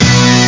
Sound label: chord